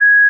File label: A flat 6, pure tones